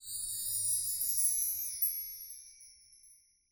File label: Chimes